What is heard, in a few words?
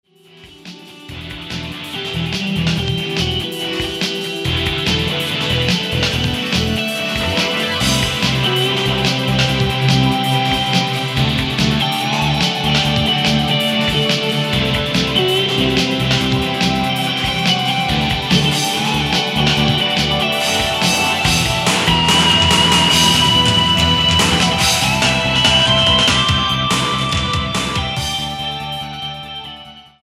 rock